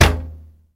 gromkij korotkij gluhoj zvuk udara derevyannoj palkoj po zheleznoj trube

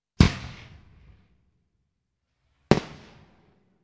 minomyotnij ogon

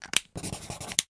rospis ruchki pered pismom

